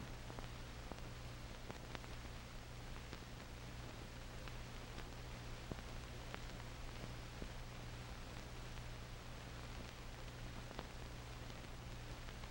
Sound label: line noise, Vinyl